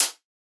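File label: closed hihat; HiHats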